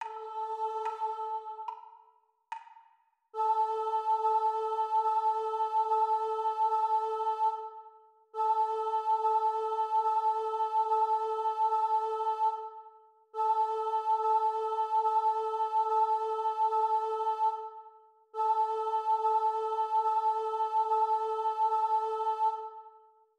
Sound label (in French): Chant Vx Seule